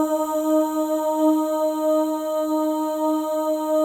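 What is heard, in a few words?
OH, female OHS, Voices; Spectrasonics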